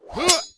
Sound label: guard attack; propack